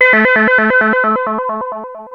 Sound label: ARP 2600 C2, synthesizer; Hollywood Edge - Giorgio Moroder Rare Synthesizer Collection